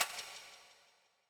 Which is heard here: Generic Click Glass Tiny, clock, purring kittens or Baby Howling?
clock